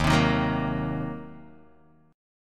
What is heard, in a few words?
Eb Minor 6th, Guitar Open D